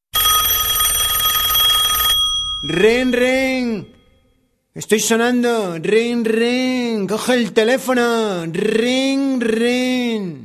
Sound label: Ring Ring